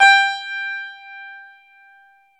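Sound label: E 12 String guitar; Club-50 - Foundations Roland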